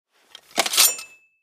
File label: dagger draw; weapons